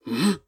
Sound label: shield